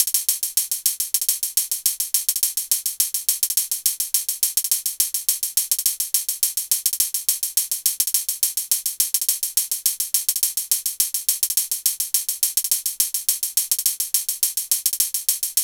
hat 105, Drum Loops, Hat Loops; Camaro; Slate; MIDNIGHT Sample Pack

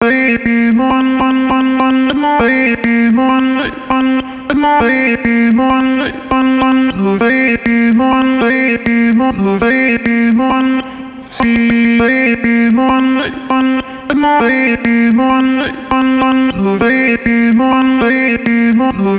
songstarter 100 Bb, Pop; Slate; SD PASADENA Pop Sample Pack

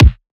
kick hammer, Drum One Shots; SD MIDNIGHT Sample Pack; Slate

